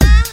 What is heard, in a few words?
moan short